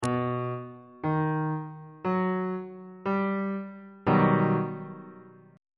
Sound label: f double sharp augmented major 7th 1st inversion on bass clef